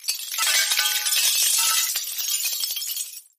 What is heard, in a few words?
Glass Debris